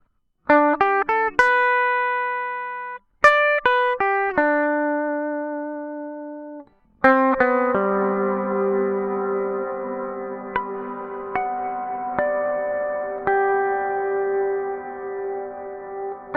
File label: Infinity Cave Hall, REV mini